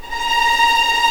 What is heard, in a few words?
A#5 TREM, VLNS TREMOLO, String; East West; The Ultimate String Collection